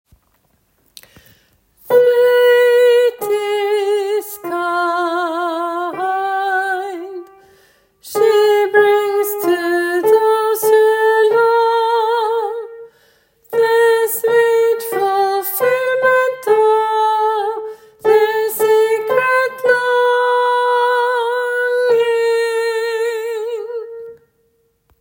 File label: bro tenor1; åfjord